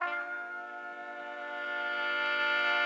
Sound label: rusty, Horns